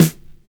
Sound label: LOVE SNAR; Univers Sons